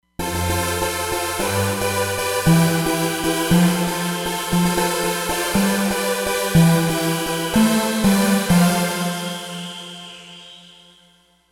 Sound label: Trance Saws, Roland Fantom XR